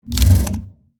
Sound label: Menu Open Container; Custom UI Sounds; Call of Duty Black Ops Cold War